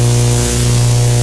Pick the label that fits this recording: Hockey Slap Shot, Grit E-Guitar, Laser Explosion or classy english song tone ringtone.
Laser Explosion